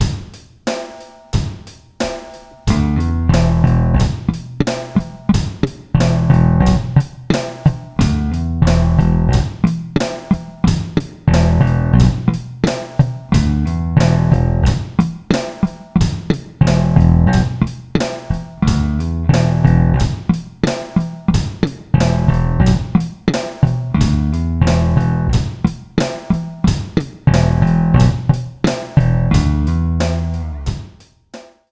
SLAP EXERCISE PARADIDDLE MODES SLOW

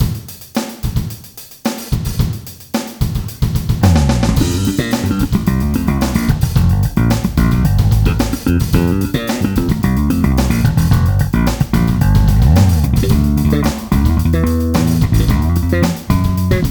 Roscoe Jazz Bass Slap Tone

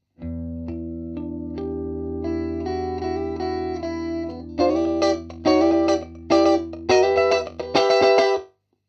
light chorus

